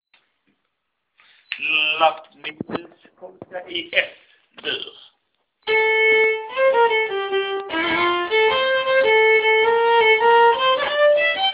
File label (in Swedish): polska Favoritpolska; Lapp-Nils